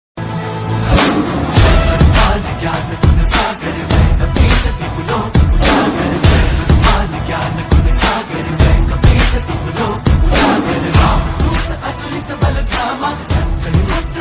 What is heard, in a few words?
Hanuman Chalisa ringtone new bgm ringtone Marathi ringtone; bajrangbali